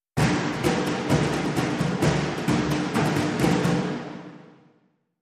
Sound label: Loop Layer Dhol Brush Ending